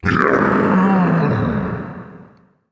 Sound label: DIE